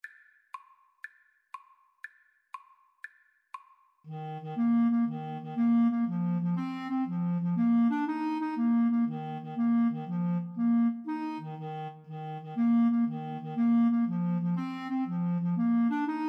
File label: irish jig medley, clarinet saxophone duet